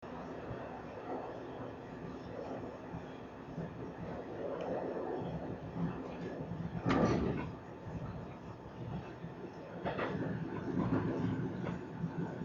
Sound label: train braking and doors